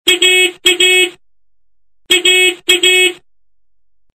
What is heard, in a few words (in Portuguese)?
buzina Fusca, som de buzina de fusca